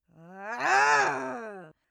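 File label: female aggressive, screams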